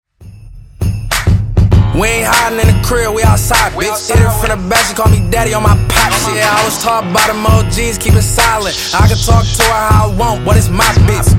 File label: Dj Intro Outro 99 Bpm; Dj Intro Outro Edit